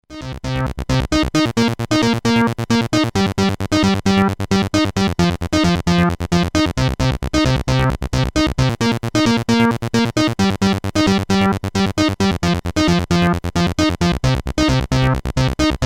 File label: Chorus Room SID off